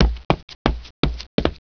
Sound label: WALK